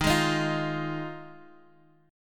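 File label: F Minor 7th, E flat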